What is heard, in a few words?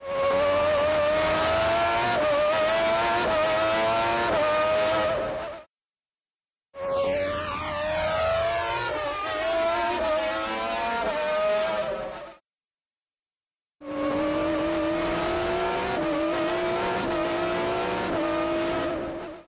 engine Chorus